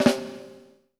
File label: FLAM VERB, PROC SNRS, Drumworks; Sampleheads; New York City Drumworks VOL-1